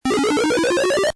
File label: item rise big